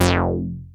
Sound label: ARP 2600 F3; Hollywood Edge - Giorgio Moroder Rare Synthesizer Collection